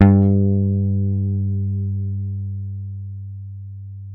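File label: jazz guitar; Univers Sons; Jazzistic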